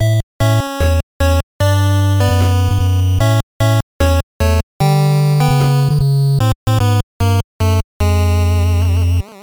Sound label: Game Over; Sonic the Hedgehog NES